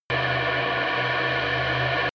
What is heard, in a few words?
drone spaceship tonal